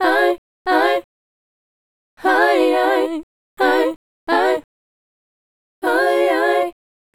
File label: Female Group, Hip-Hop and RnB Vocals; Sonic Foundry (Sony Creative Software)